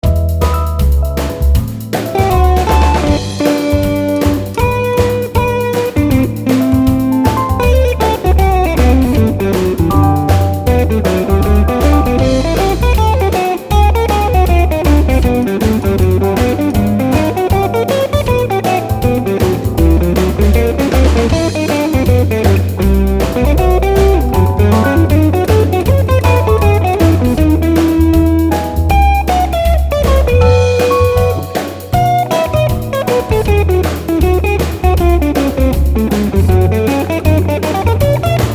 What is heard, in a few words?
158bpm Bridge Mix